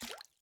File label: step splash; OneShot Fading Memory